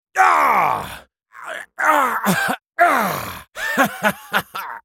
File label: dying; Draven